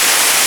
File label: Ocean Wave